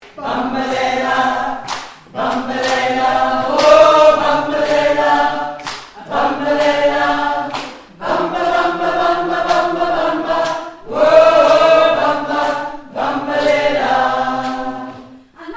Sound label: Alto